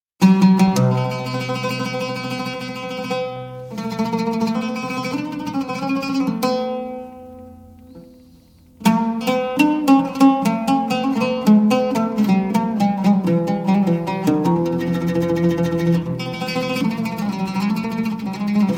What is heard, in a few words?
Arab Taqasim Improvisation